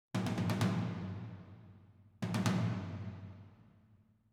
Perc

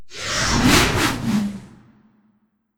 Wind Sirocco; Wind Sirocco All2

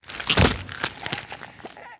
Damned Deathcrack

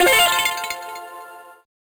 Cast Success; Wizards of Waverly Place Spellbound; Spellbound (DS) (gamerip) (2010); NDS

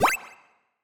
Menu SFX Gain Life

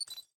Teleport Activate

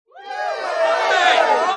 Music Frontend

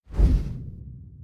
spin attack boom; unsighted

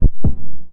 Heartbeat; Heartbeat B 01

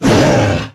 Monster Attack Finisher; Monster George Attack Finisher

